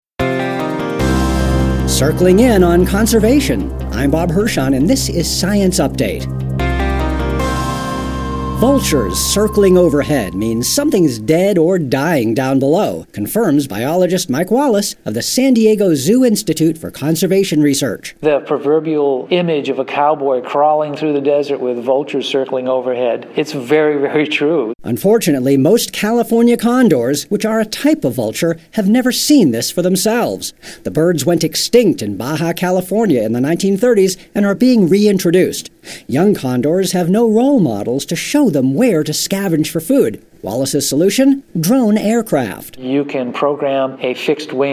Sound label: drone